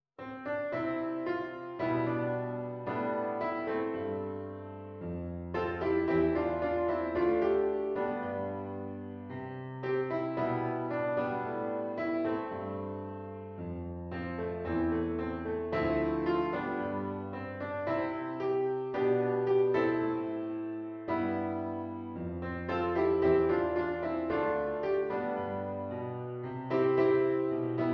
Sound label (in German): Klaviersatz voller; Cramer